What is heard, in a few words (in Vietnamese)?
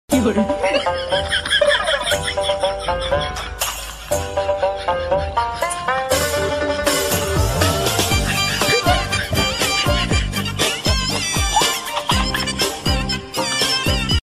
Hieu ung am thanh trung quoc